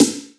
Snares, Real

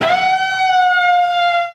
feedback

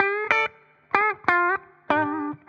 guitar inch by inch; Loops; Phonky

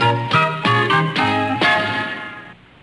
commercial stinger

